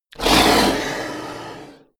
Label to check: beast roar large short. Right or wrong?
Right